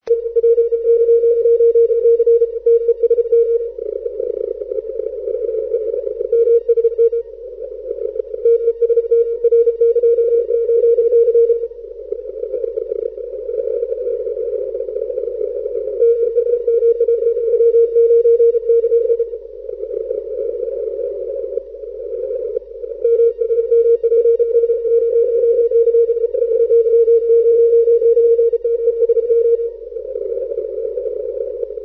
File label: echo; ry9c; Veel backscatter/ multypath echo's slechte signalen in de morgen.; ARRL 10m contest